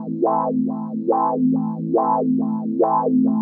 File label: TECHNO PAD 140; Keys